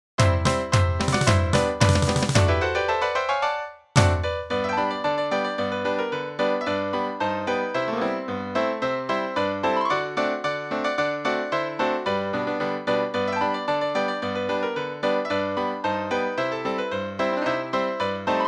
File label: Jingle Bells, draaiorgels